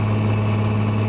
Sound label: Wheel Move; WarZone 2100